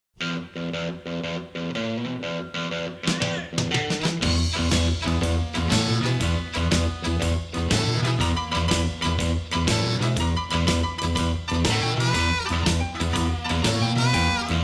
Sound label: music